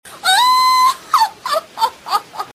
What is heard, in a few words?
laugh